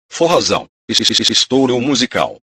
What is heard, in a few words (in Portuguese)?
abertura forrozao